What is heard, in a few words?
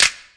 urban trap snap; Urban Trap Kit; Hip Hop; STYLE KITS (Hip Hop, Etc)